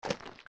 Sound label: steps; Jabu Jabu; zelda; OOT